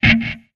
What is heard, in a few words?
Music Muted E Guitar